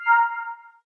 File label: System Delete; Xiaomi MIUI-9